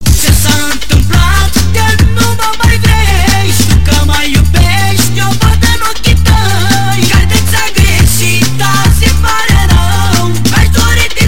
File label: tone